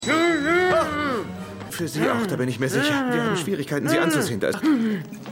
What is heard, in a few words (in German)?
Laute; Ares Kostopolous; Pushing Daisies